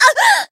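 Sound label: damaged, Japanese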